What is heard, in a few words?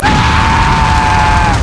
scream Male